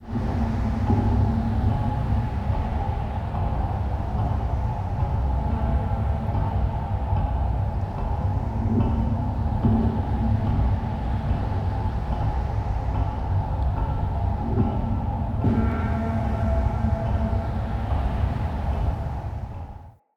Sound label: Diorama Bayor Ambience; Cinematics; Orengall Quest